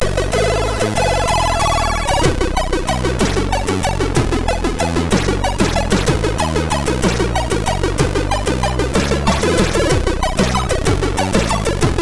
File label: sid MOS6581R3